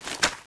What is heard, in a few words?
ng7 clip in, weapons